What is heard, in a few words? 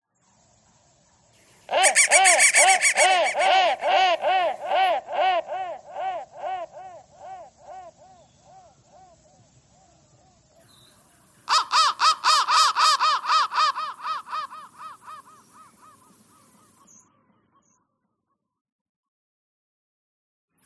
crow thread noise